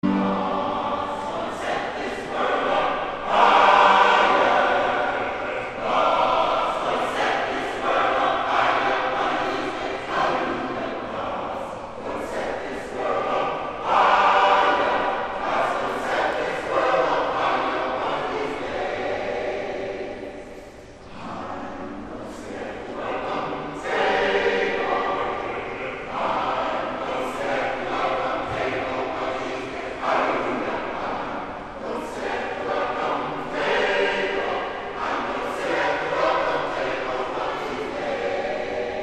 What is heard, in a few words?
Communion, Alumni Chorale; H Alvin Green Memorial Alumni Chorale